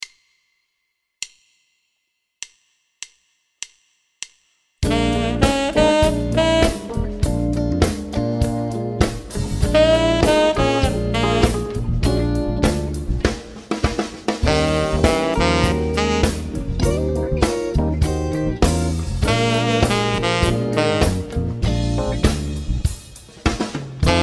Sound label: Bb Blues